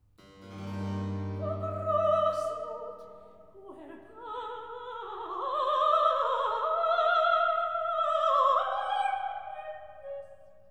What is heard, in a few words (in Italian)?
Recitativo; O Großmut!; Brockes Passion